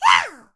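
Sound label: MON ATTACK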